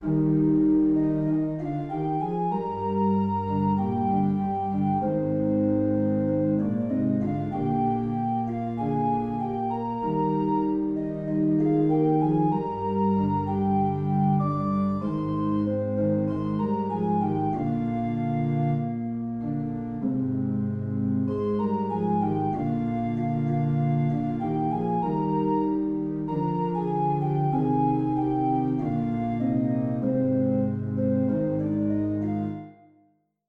orgel in Ess

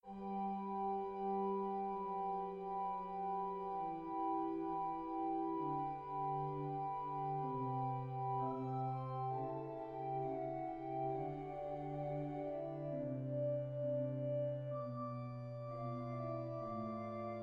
Air 3 stammig

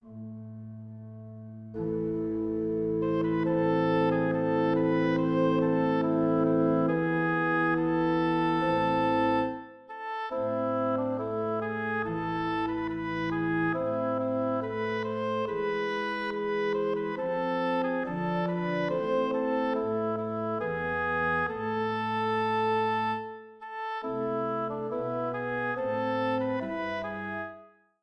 Fäbodpsalm från Mora

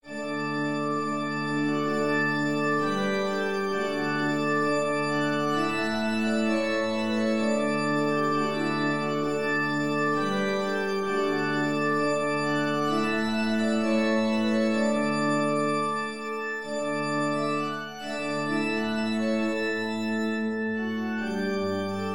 Skanklat fran Dala Jarna

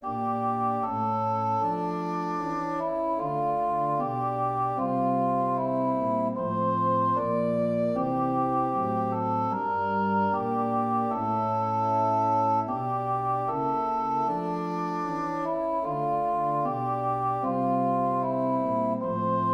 Nordisk sång

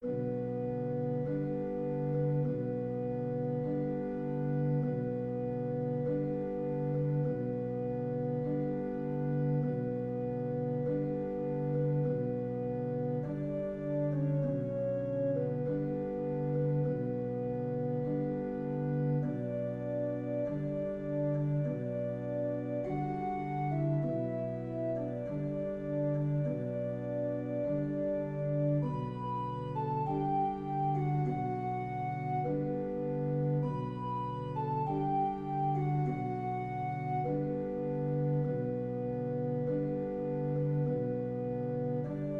Sorgm Chopin orgel

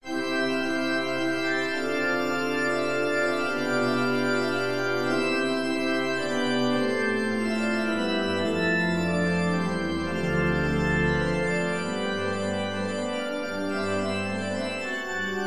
Preludium i klassisk stil

